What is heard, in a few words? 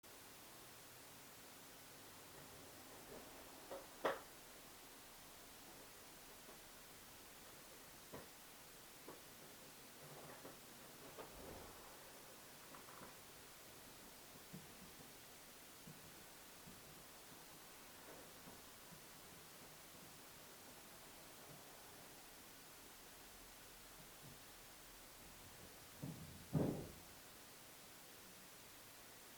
Footsteps, floor creaks; Devoe House; EVP